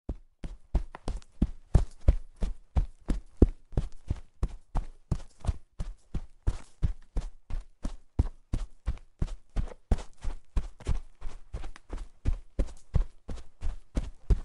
Footsteps. Running in sand with shoes.

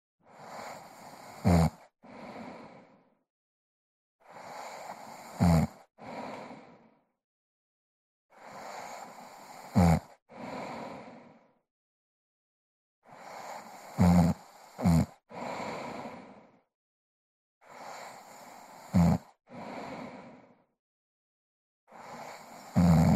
Woman Snoring

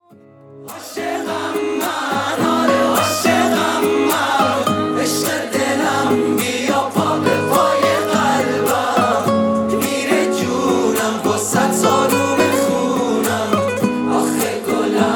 With Guitar

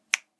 Snap; صدای بشکن بشکن; human

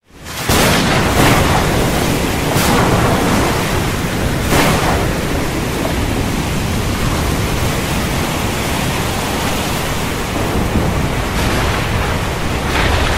heavy storm ocean

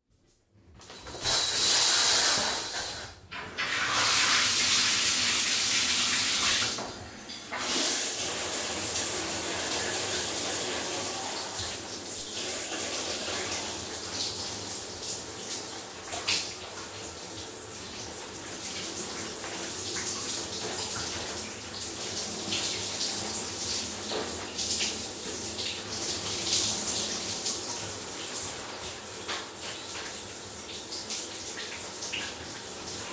Taking a shower sound

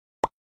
Item Pickup Sound

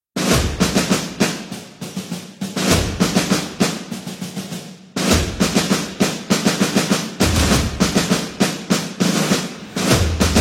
Military Marching Drum

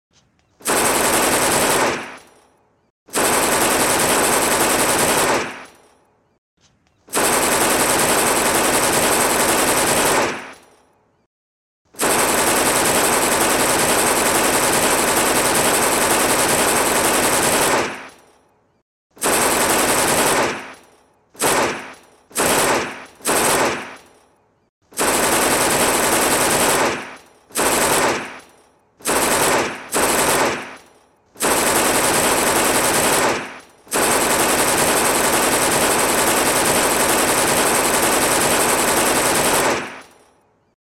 Thompson SMG

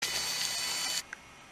camera zoom

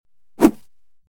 woosh

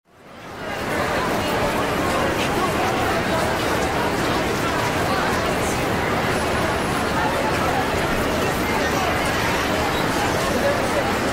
Busy Street Crowd in City; ambience

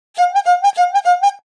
Clown beep

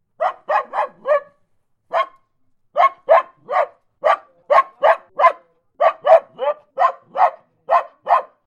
wild dog sound; angry dog; animal

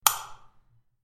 lamp key

